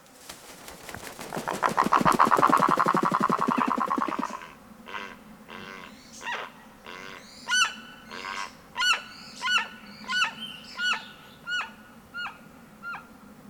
White winged guan

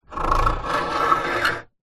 Open window; باز کردن

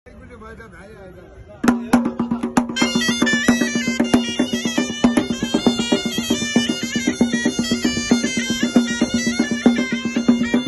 snake flute

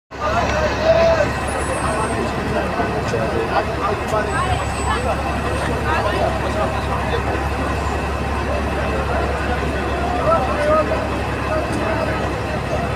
Arab crowd; همهمه عربی

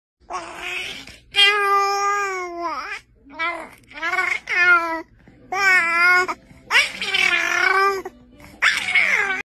Baby without words; صدای کودک بدون گفتن کلمه ای

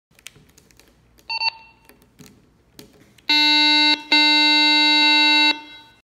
Wireless walkie talkie

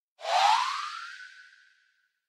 appear

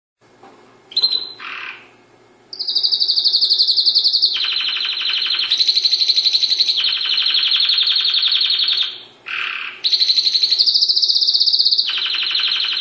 dutch canary sound

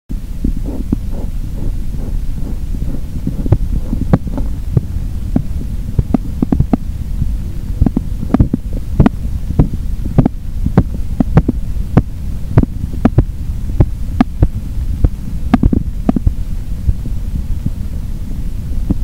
manual blood pressure monitor

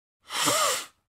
Breathe through nose

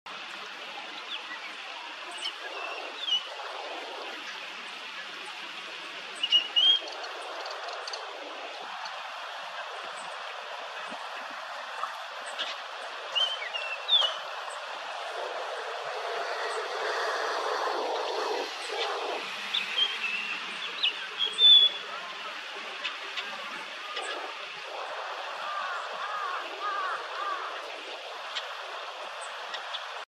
Eophona personata

